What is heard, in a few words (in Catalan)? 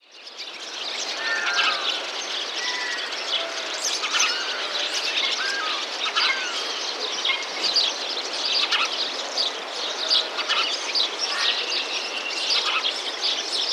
ocells; sons